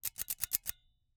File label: Icecream Scoop, metal, mechanical, teeth, gear, squeeze, release, triple; Eiravaein Works; Vaeyan IV